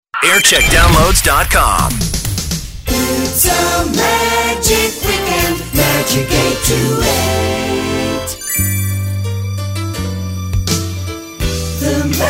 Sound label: jingles; magic 828